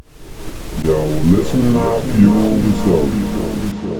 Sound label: Hardstyle Vocal